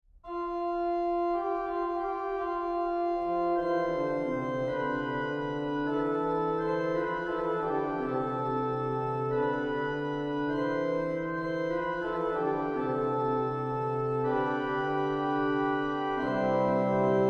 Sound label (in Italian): intonazione imitazione; 3) Intonazione sopra O veritas Deus